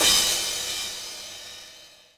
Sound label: CYMBAL, Yamaha RM 50